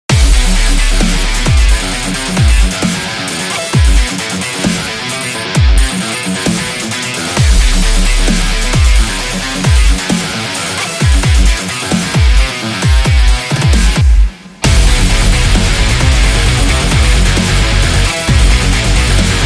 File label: Sports Extreme, epic sport metal